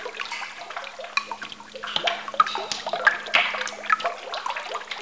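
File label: water trickle; dystopia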